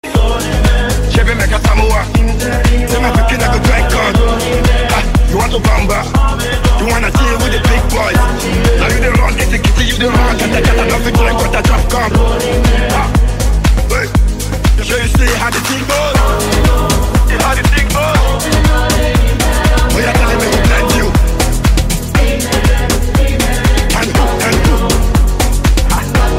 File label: Amapiano